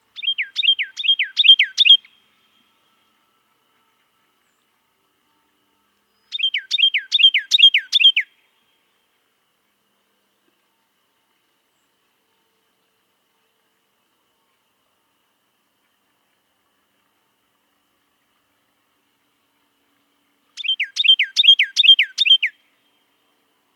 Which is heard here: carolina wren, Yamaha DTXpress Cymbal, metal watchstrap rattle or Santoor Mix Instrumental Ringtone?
carolina wren